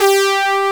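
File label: brass G2, SYNTHESIZER analog brass; AKAI S6000 CD-ROM - Volume 1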